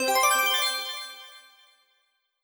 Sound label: accent brilliant high slow